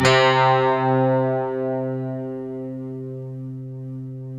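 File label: GUI, Slow Strings; Optical Media International - Sonic Images Library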